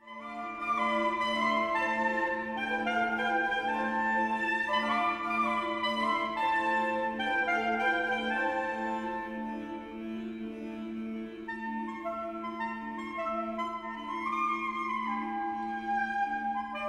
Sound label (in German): Sax Orgel; Friedenskirche